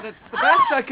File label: sample, male laugh